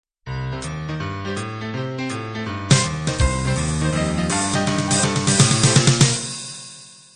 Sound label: boogie woogie intro